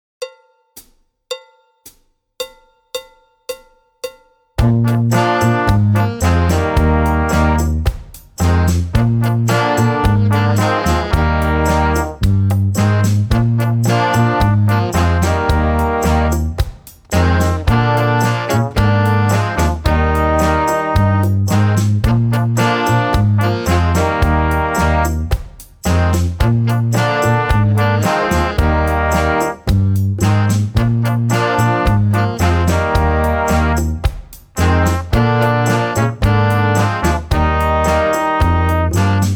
Melodie

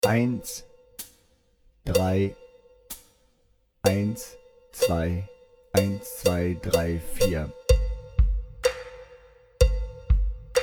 Power Rock ohne Drums langsamer

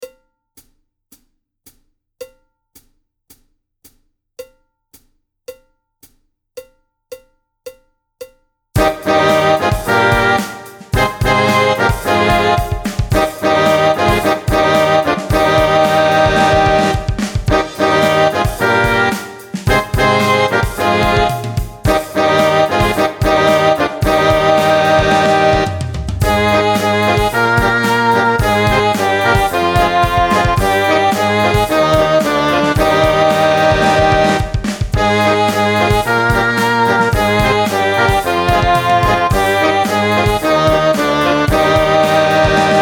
Zweistimmige Uebung, brass duett; Brass Online – Grande Fanfare

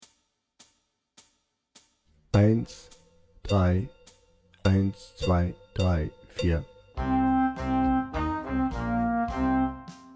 Hard Rock Blues Klarinette lauter langsamer

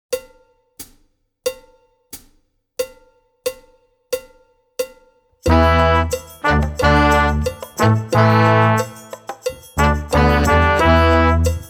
Brass Song ohne Drums

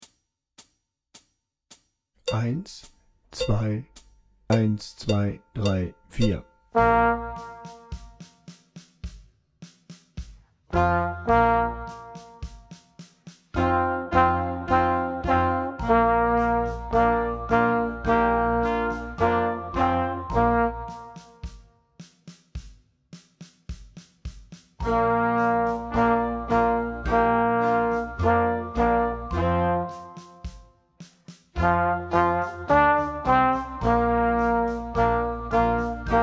Rock Horn lauter langsamer

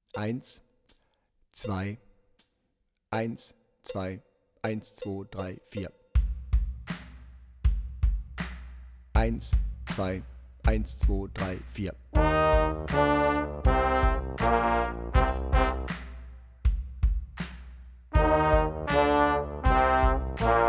Power Rock Playback Alt Sax Lauter

